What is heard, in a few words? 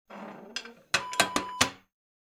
wood oven door close